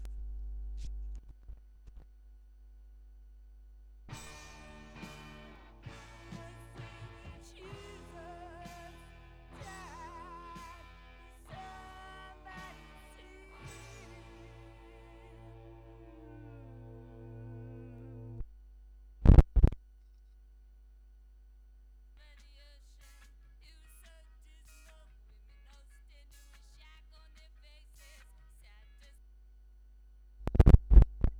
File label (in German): schallplattengeraeusche, One Channel